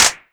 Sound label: Clap; TRX